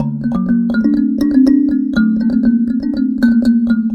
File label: Kalimba; Ambient Atmospheres & Rhythms; Ambient-Melodics-I